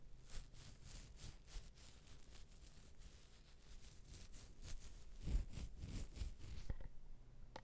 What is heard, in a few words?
Beard Scratching